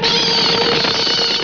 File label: Bird; 特酷声音素材库